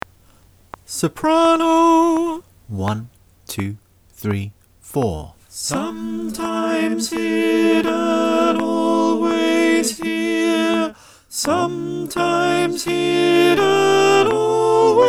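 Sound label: soprano, choir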